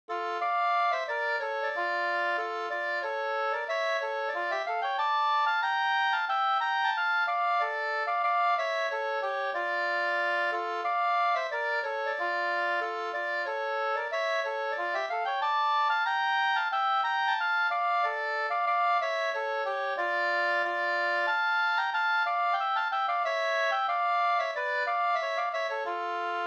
ganglat Fabodlat; Malarhojden

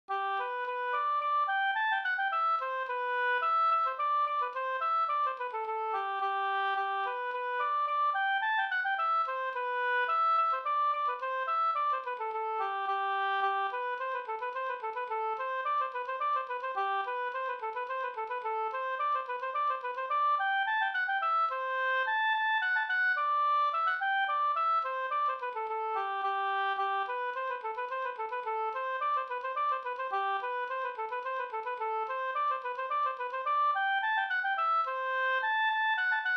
slängpolska

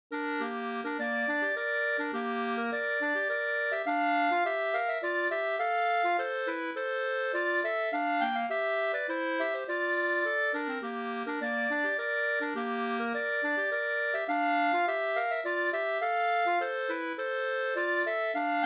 polska senpolska

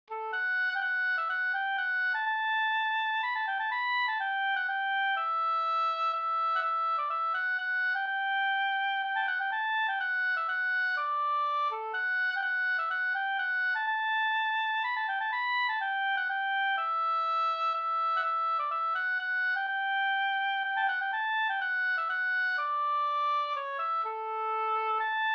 hamburska

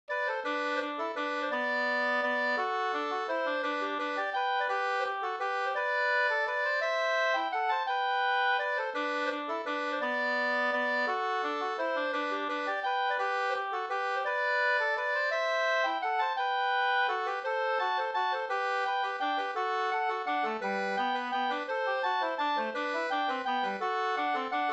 vals; Anundsjo

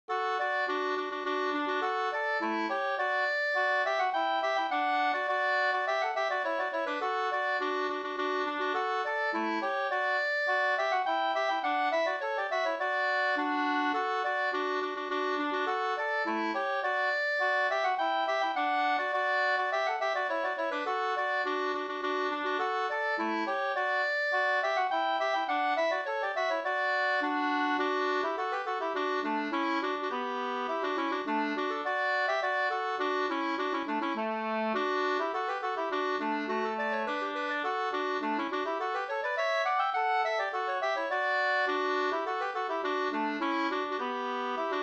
slangpolska

